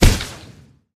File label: win Symbol Flash; fairyred